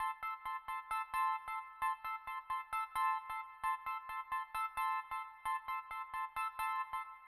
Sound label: Bell Riff F-E-C-A-D; Big Fish Audio - Old Skool House; OSH Construction Kits